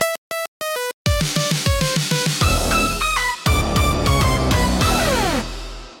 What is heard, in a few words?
jingle cool; 効果音・ジングル(ショートBGM)